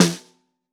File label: SN2 Bottom, Snare; Ableton Foundation; Snare Designer